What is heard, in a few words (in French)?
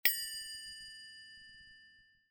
crotale, batterie